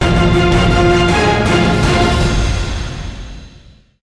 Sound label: planechase end; call of duty modern warfare 2